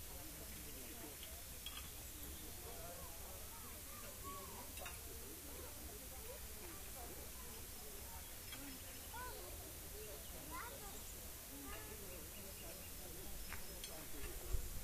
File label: rayol farm; SEAMED